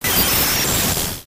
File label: magic electron